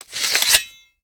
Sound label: Katana Underlay Play